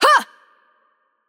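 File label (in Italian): Attack Heavy, Italian; VO; bloom minigame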